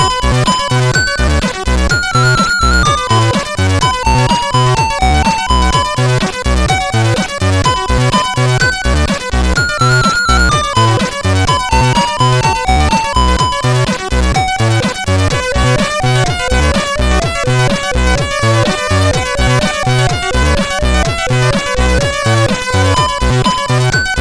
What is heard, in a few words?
Bell Test, CSG8580R5; hvsc